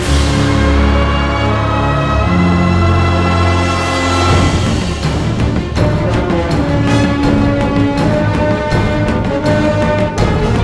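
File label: Mid Combat loop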